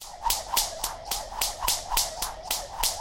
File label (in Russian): perepletenie vozdushnyiy uzor, skakalki